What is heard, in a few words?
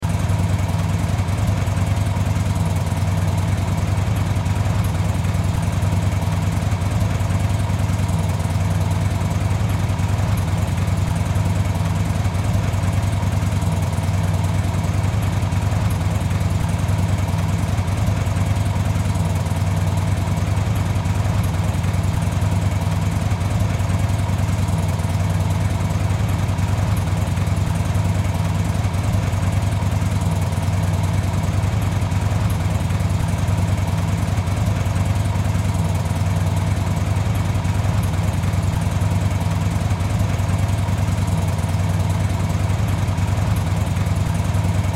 Motorcycle Idle Loop; Transportation Automobile; Motorcycles